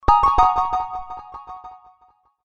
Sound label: Settle Notification, Digi Delay; HTC